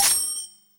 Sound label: bid win